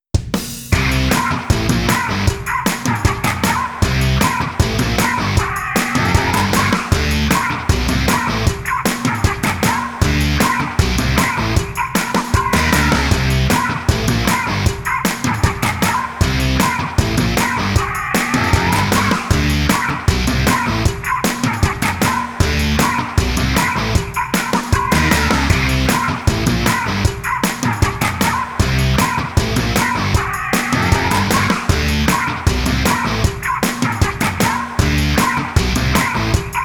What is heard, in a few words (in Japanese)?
バトル 勝ちリザルト